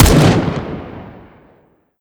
fire, m16 weapons; Urban-Coalition; Decay; arccw ud

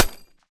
mech, m249; Urban-Coalition; Anarchy; arccw ue

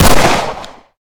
fire, glock; weapons; Urban-Coalition; Decay; arccw ud

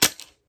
eject, 870; weapons; Urban-Coalition; Decay; arccw ud

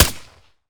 fire sup, glock; Urban-Coalition; Decay; arccw ud

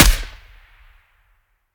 fire first sup, m16; Urban-Coalition; Decay; arccw ud

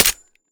mech, glock; weapons; Urban-Coalition; Decay; arccw ud

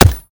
fire supp, glock; weapons; Urban-Coalition; Decay; arccw ud

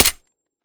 mech, glock; weapons; arccw ud

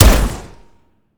fire, weapons 870; Urban-Coalition; Decay; arccw ud

